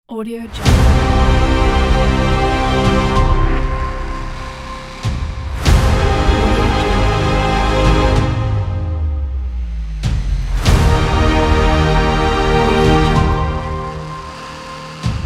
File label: the epic trailer logo; blacksunaeon